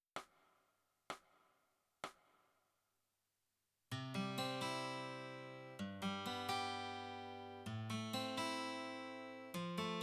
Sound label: Guitar